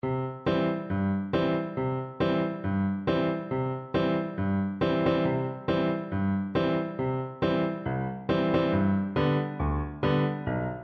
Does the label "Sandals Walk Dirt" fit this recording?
No